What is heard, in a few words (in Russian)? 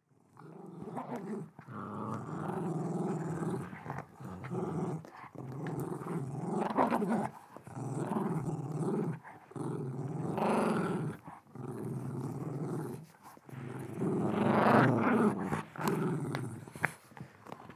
zvuki rychaniya sobak